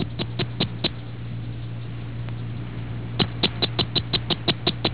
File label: acris, amphibians anura